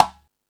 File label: Latin Layers, drum machine